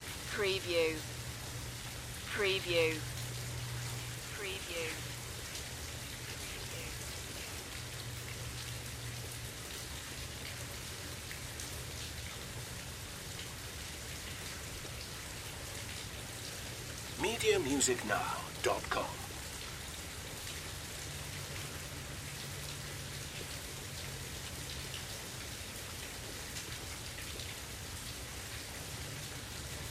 Medium Rain Long

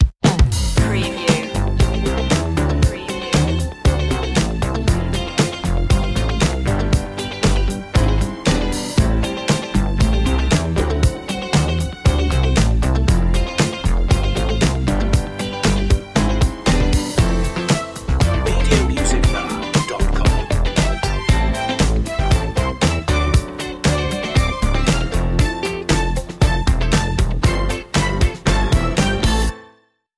boogie disco

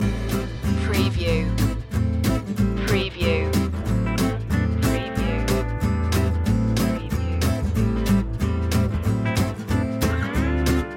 left bank blues loop

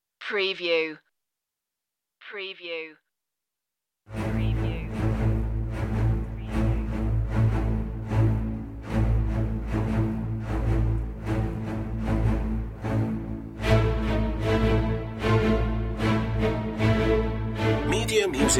exodus strings

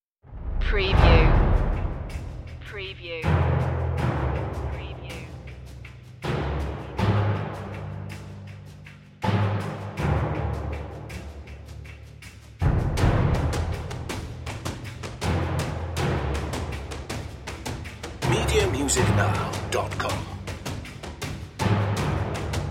perc

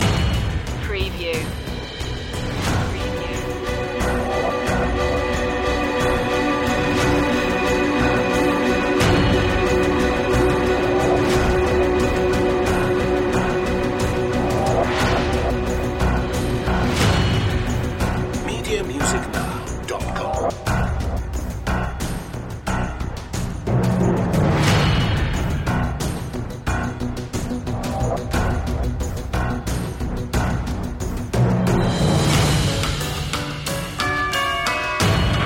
perc and synth

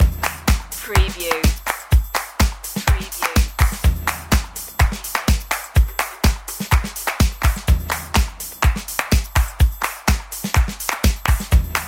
exciting drums loop

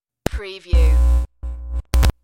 Static Noise

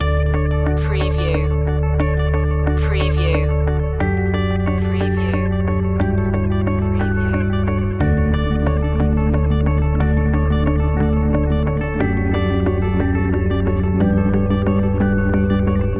subtle achievement loop

